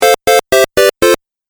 Game Music Phrase I, video game; Video Game Sound Effects

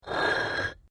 PAVING STONE MOVE, dragging

